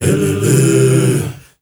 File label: MALE HUM; Spectrasonics; Heart of Africa